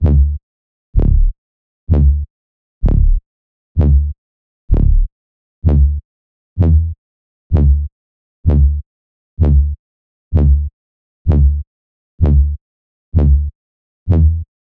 128 BPM D Min Bass, House; Drop Loops; Cymatics; Destiny

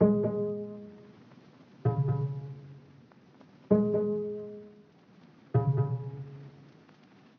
Various Melody Loop - 130 BPM G# Min Bass; Melodies; Loop Stems; Cymatics; Oracle Sample Pack; Oracle Various Melody Loop 9

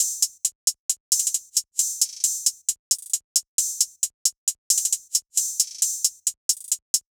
Hihat Loop 134 BPM; Pushin; Cymatics; Fiji Hihat Loops & Percussion

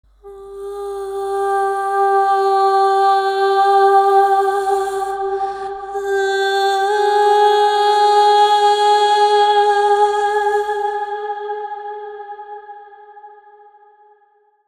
Texture Vocals - Fm 80Bpm WET; Construction Kits; Ghosthack; SkyRealm; Kit 08 - Into The Light

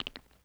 Teeth Clack Soft; Vintage Cartoon; Foley

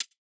Hihat, Dubstep; Ghosthack

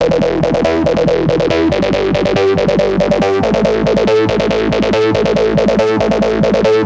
Melody Loop Emin 140BPM, dubstep; Glitter; Ghosthack; Dubstep Sounds 2025

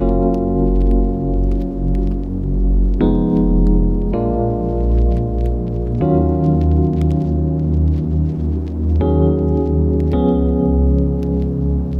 Lofi Melody Loop 80 BPM F# Maj; Melodics; Cymatics - Eternity Sample Pack

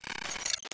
Glitch

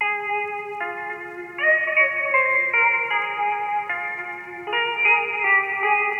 Happy Melody Loop - 155 BPM F Min Guitar; Cymatics; Oracle Sample Pack

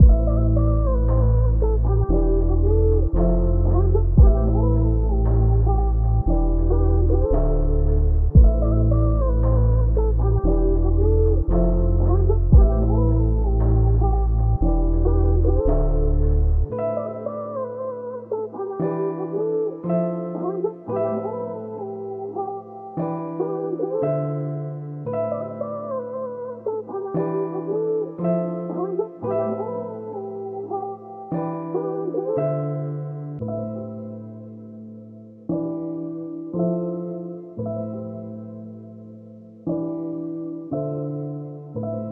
115 BPM A Min, Melody; Palm Trees; Cymatics; Infinity Melody Collection

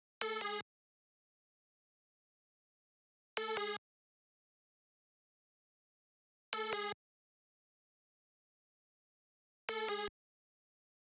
152 BPM F# Min Key; Cymatics; 2022 Melody Collection; Wavy; Off Route

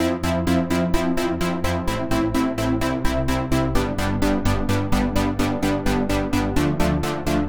Soft Chord Loop - 128 BPM A min; House; Synths; Chord Loops

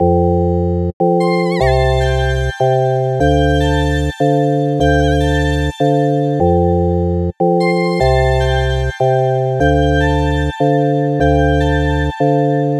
Happy Melody Loop 150 BPM F Maj; Cymatics; Oracle Sample Pack

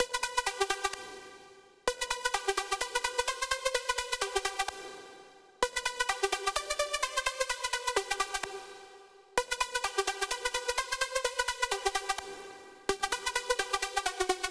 128 BPM E Min Lead; House; Drop Loops; Cymatics; Goliath

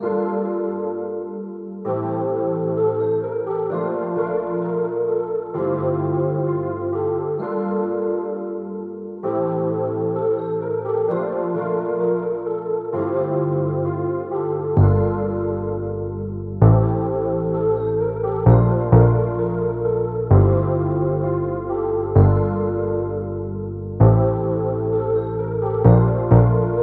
R&B Melody Loop - 130 BPM G Min; Cymatics; Oracle Sample Pack

